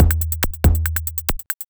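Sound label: DRUMLOOP ELECTRO 140; Techno2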